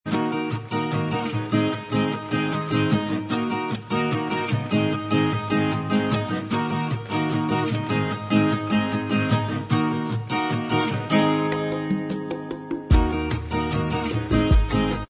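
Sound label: bossa instrumental track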